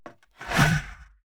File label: fallen strike